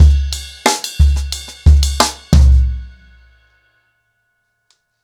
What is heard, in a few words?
End 90BPM, ACOUSTIC DRUMS; Sonokinetic - The Roots Drumloops